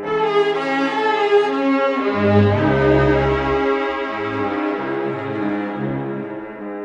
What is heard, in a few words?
strings, Loops, Sad